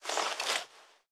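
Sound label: Weapon rolling pin equip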